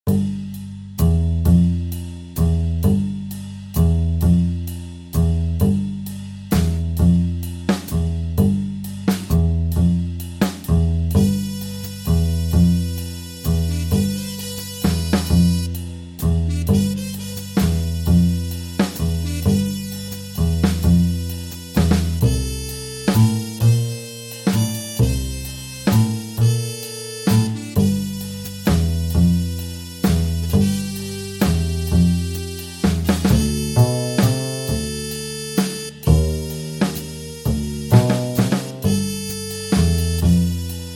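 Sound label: upright acoustic double bass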